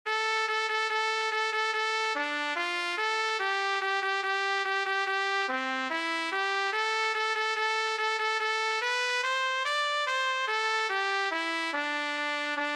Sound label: Drunken sailor Cornet en Sib